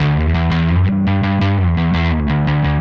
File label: Static Bass